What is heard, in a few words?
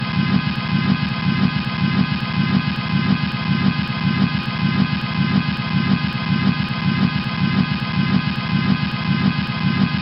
Honking Repeated